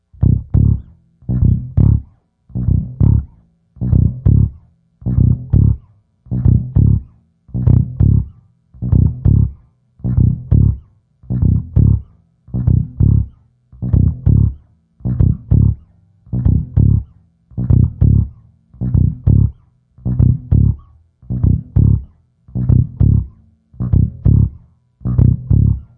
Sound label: wah wah, BASS; melodic samples